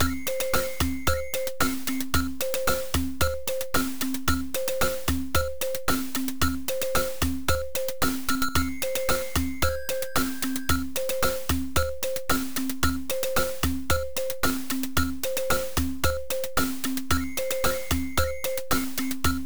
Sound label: Rhythm Loop; General Purpose 10